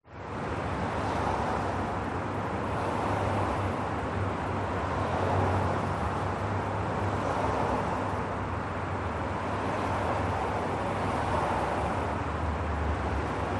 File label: Traffic City; Audio Hero